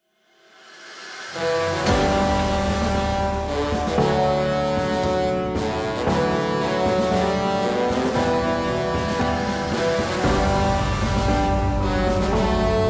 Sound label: watani